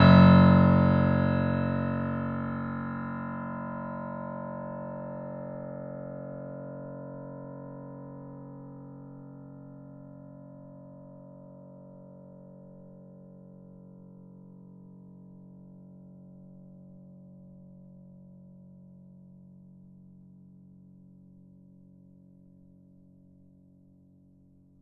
F#1, bitKlavier Grand Binaural

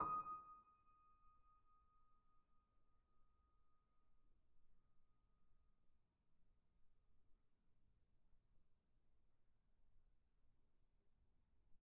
D#6, bitKlavierGrand Binaural

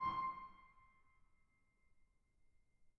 harm C6, bitKlavierGrand Binaural

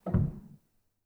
rel, Grand; bitKlavier; Binaural

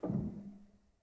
rel, Grand

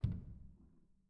pedal U, bitKlavierGrand Binaural

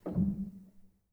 rel, Grand; bitKlavier; Binaural

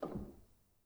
rel, Grand

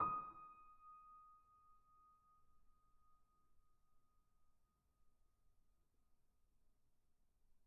D#6, bitKlavierGrand Binaural

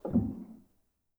rel, Grand

